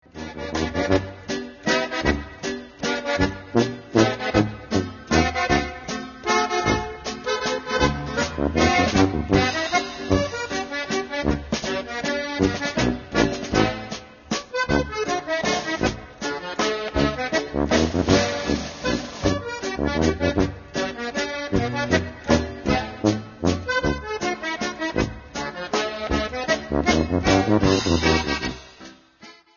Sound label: Dutch